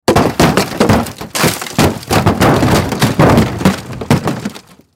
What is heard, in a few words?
Crash Metal Large Debris Roll Spill Fall; Crash Metal Large Debris Movement Roll Spill Fall; Blastwave